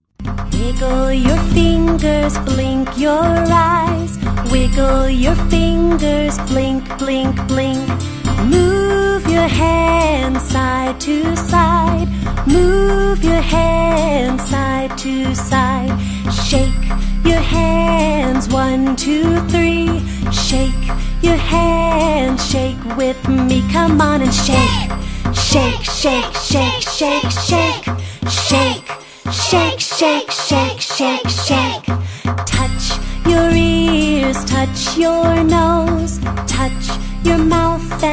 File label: Children song, Short cartoon version